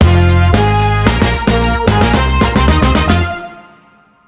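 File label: Boss Stage End Win; Hamsterdam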